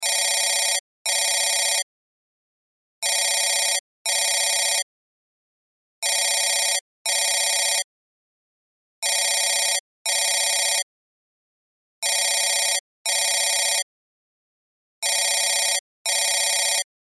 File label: Cell Ringtone